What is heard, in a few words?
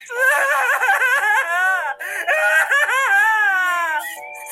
crying sound